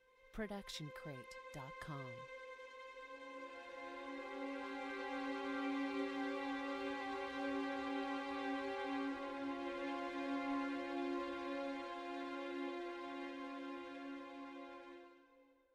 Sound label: sad string